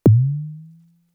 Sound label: Percs, Elektron MachineDrum; SPS1 MKII